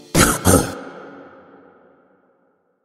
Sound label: coughs; garcello